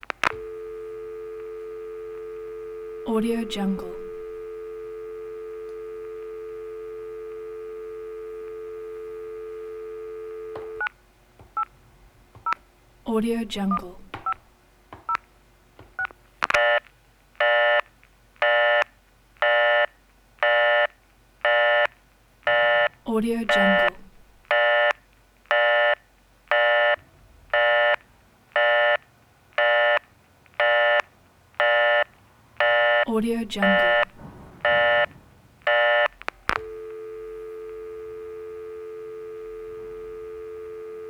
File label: phone tones dial tone touch tone beeps